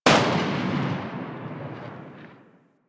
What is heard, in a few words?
Harpoon Gun Tail; Warframe; Sharkwing; Grineer